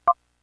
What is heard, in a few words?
Sounds Effects